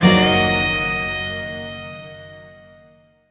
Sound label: Music Guitar Ending